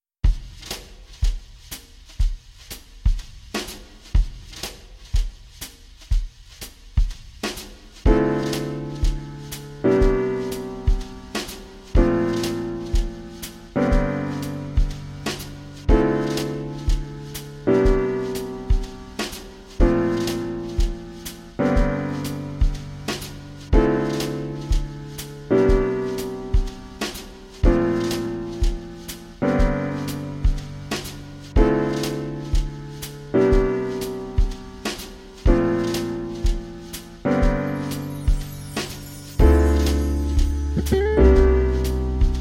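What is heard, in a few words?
swing jazz, cocktail jazz, 432 Hz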